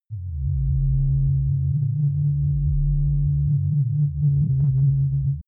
low abstract synth; energie

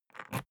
briefcase plastic latch lock key pull out ringing; industrie wirtschaft; bueroarbeit

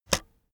keyboard external space release heavy

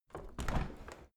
window handle open soft; objekte; fenster

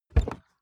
car bmw e46 interior door rear open short; Cars

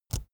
keyboard laptop enter push release; kommunikation; computer

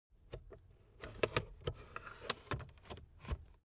car backseat table fold in slow

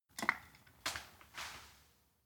log drop forest; foerster

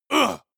male combat hit soft; soundpool

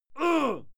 male fight yell muted

